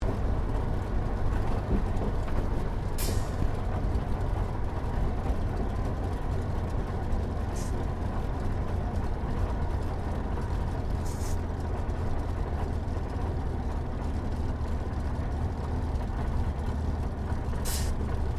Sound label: AIRCRAFT SUPERMARINE SPITFIRE MK-XVI TAXI INTERIOR STEREO; Airplane Sound Effects